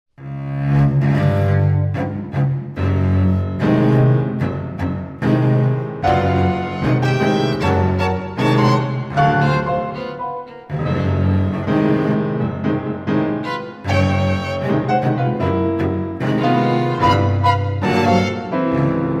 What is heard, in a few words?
rock song